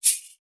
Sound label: GANZA, SHK+RATTL; Spectrasonics; Heart of Africa